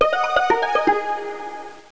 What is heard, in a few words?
SYNTHY